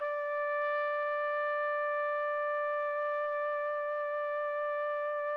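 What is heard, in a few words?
TPT, trumpet; Roland - LA Composer vol. 3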